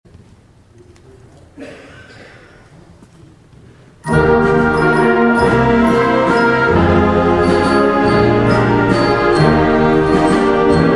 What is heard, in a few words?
waltz